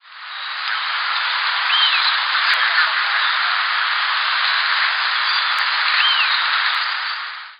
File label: Elaenia